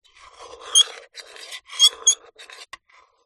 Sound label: food pasta cooked in metal pan movements with metal spoon scrape squeak; zapsplat